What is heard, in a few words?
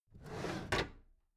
packed drawer smooth clatter open regular halfway